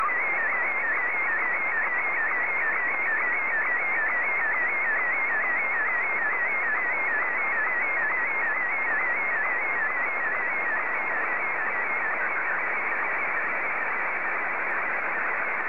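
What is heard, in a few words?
SSTV, Martin 1; ZL2TJM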